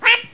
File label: quack